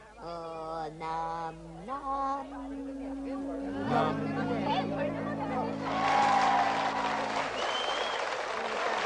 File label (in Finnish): silakkalaulu